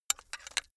metal pipe handling short

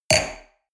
wine glass place table medium; berufe; soundpool

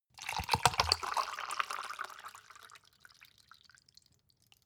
cup plastic refill bottle; industrie wirtschaft; gastronomie

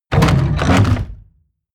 mechanical lever wood medium down; industrie-wirtschaft; mechanik

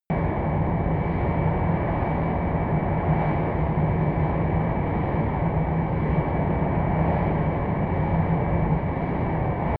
plane cessna private turbine steady distant

